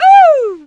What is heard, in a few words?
COWGIRL, VOCAL